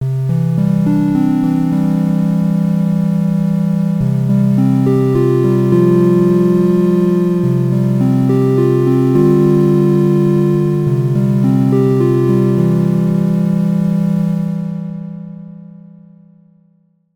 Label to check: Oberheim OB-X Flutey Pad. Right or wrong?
Right